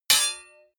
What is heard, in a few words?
block metal small